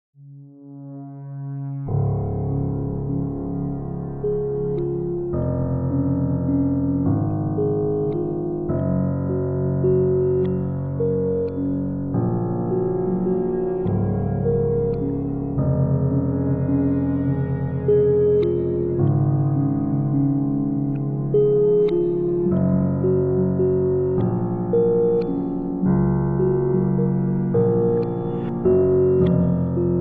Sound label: Panoramic Ambient